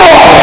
pain

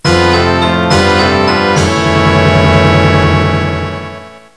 item get

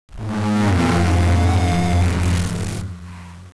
the wall weird

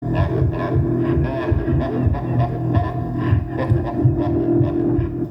Alien amb; Resurgence

